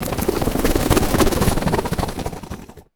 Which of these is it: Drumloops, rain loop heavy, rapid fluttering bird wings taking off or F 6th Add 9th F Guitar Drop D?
rapid fluttering bird wings taking off